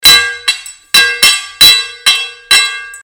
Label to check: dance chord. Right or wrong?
Wrong